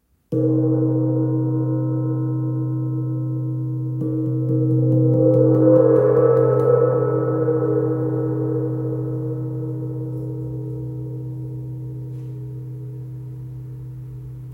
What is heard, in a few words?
Wind gong do 55cm